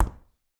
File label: Refrigerator Door Open, Household